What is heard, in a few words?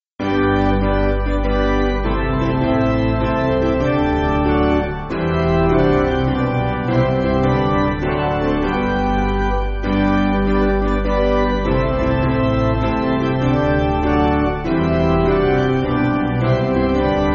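Piano, Free Music Backing Files for Churches; Like The Sun; Ahnfelt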